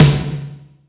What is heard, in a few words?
80s Drum Snare; Yamaha PSR E305